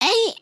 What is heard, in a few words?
generic build; emotes